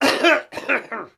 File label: human coughing male 80 years